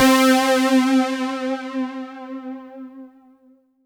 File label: C5, Synth Line